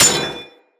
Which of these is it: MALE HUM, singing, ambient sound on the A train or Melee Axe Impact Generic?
Melee Axe Impact Generic